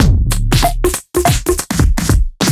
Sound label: Duo Swing Mix A 95; off the grid